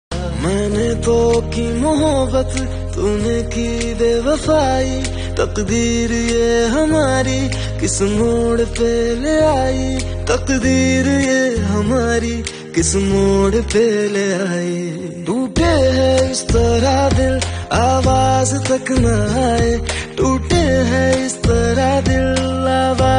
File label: Sad Song Ringtone